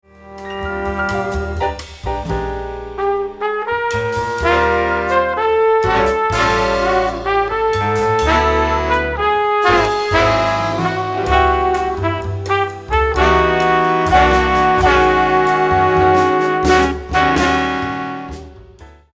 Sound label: trumpet